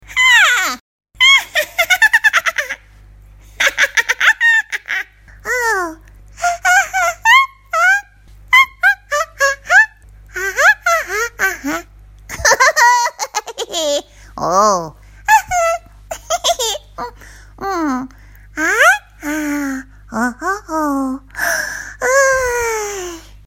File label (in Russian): Multyashnye zvuki